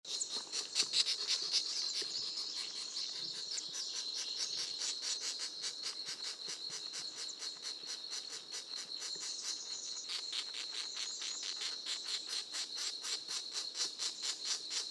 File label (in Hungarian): kontyos feketeharkaly hangja